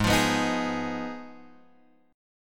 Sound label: G sharp Minor 6th G sharp down Guitar Drop D